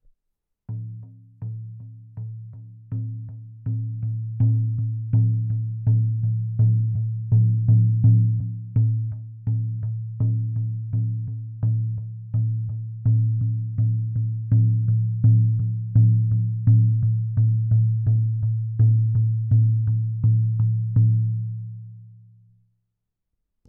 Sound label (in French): ovale 38 cm croix, tambour chamanique ovale cerf peuplier